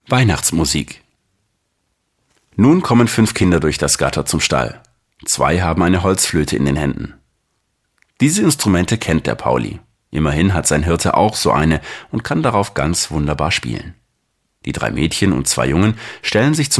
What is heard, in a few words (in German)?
Weihnachtsmusik